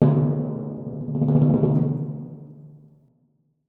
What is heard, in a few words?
Timpani, Jazz for piano